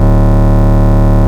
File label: Bass D, Root D; Vengeance Samples; Vengeance Ultimate Bass